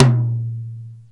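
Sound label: Tom, Roland S50 Percussion; Drum Samples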